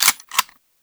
Fire Mech; Warframe; Bronco

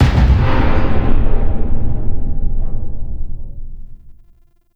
Ram Sled Intrusion Far; RailJack Hazards

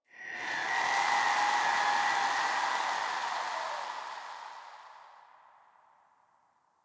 Corrupted Wind Gust Toxic, Ambience

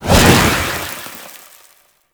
Manic Teleport In; Warframe; Grineer

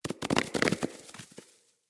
Barrel Apple Spill

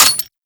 Grineer Lever Action Pistol Mech; Weapons

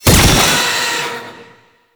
Fist Claw Spike Spawn; Weapons; Warframe; Tenno; Incarnon

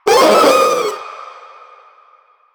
Proto Infested Runner Vocal Short Attack; Warframe

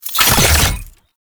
Exterminate Key Deposit; Warframe; Nineteeen Ninety Nine

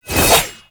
Stab Attack; Enemies; Abilities; Warframe; Tau; Dax Ninja

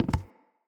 Walk Wood, Footsteps

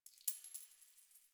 Coin Drop; Warframe; Nineteen Ninety Nine

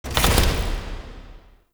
UI Mod Deactivate Ordinance; Warframe; Railjack; Player Ship

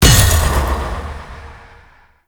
Pre Death End; Warframe; Duviri; Dax

